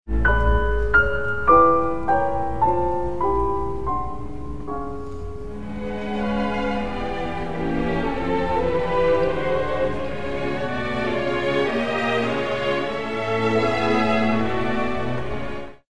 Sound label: Ballade piano et orch; Faure